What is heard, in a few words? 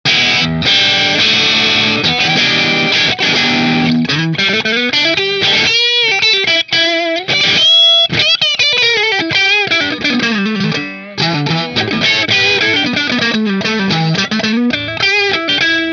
Guillford middle marshall